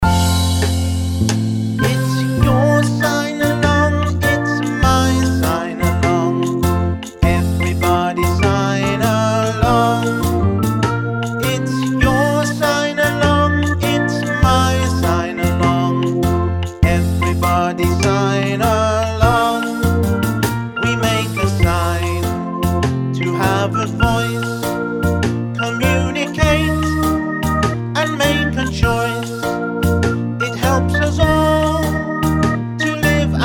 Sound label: vox